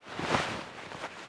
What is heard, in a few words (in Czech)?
obleceni; Mafie 1